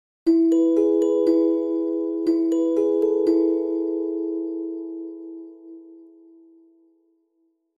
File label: ringtone